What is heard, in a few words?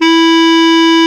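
Bb ff Clarinet G#2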